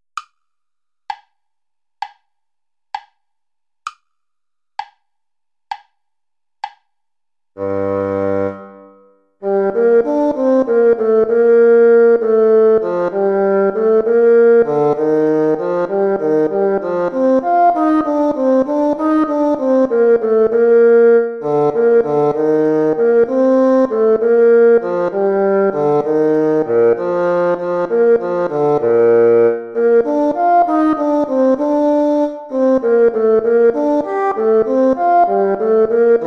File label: gigue